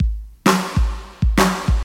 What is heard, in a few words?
131 bpm drum groove g sharp key, Free breakbeat sample - kick tuned to the G# note; drum loops